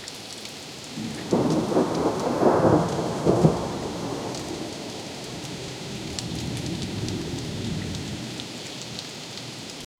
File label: wind through trees birds, birds chirping